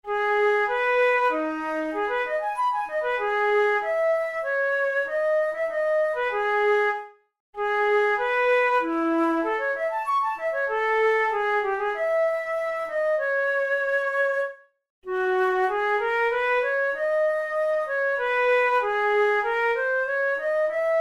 studies for the boehm flute; drouet 72

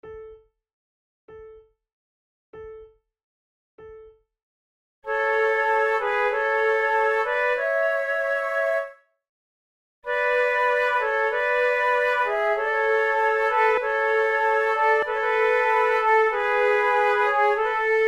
sonate en trio, lentement, flute

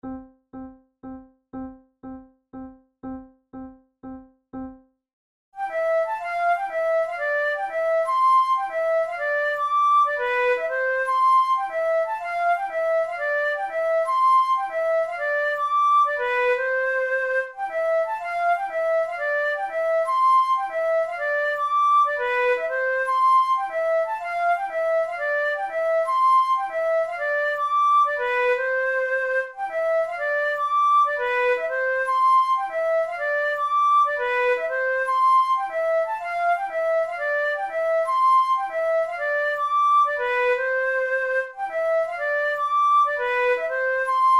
waltz duet

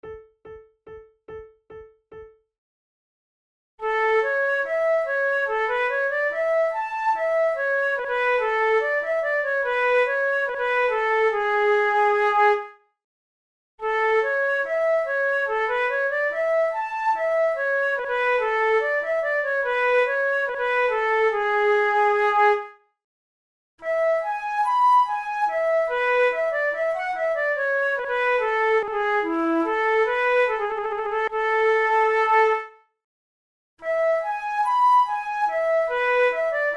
menuets, flute

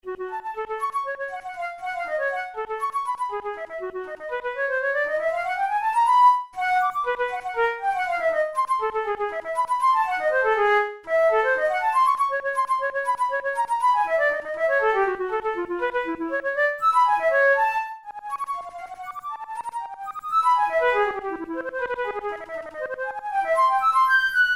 studies for flute solo; karg-elert

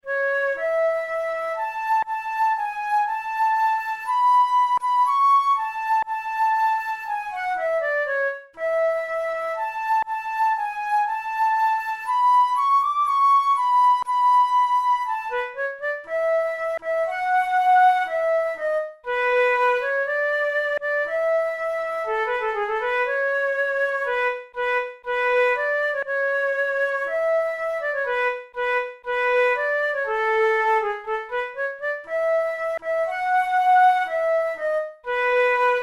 rondo, flute

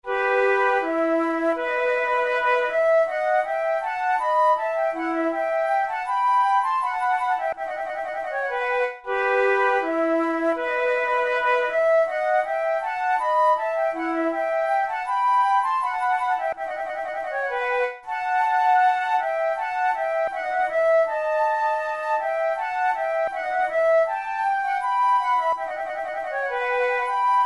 flute

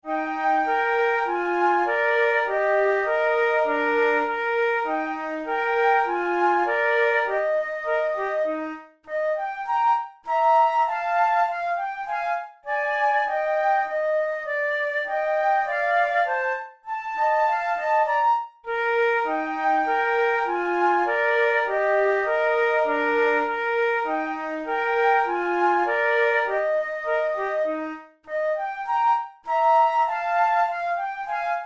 methode de flute; tulou

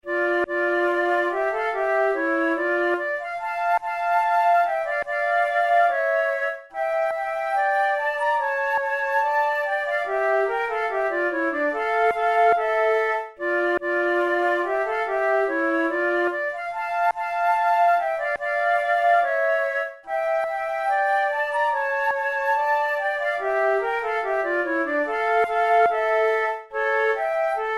pour la flute duos andante; devienne; nouvelle methode

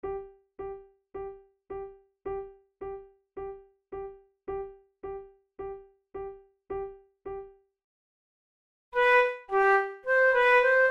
methode de flute; tulou

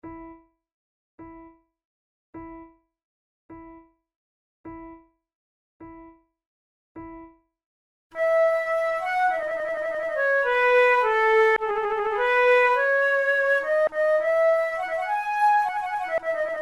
two flutes air; Monteclair; 6 concerts; flute tunes

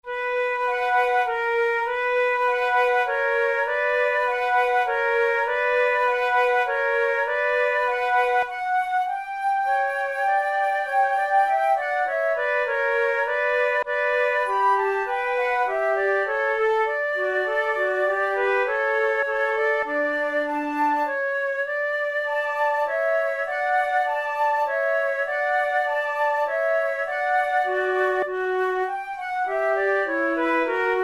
sonata for two flutes; in d major; soave

